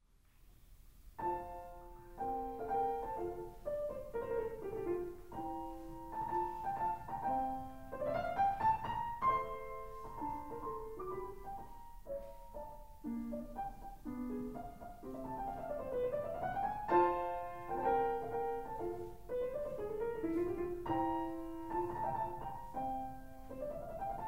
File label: Organ; Mozart